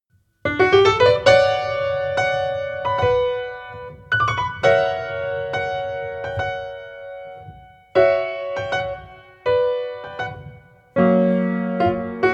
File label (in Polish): Fortepian